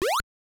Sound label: jump; metroid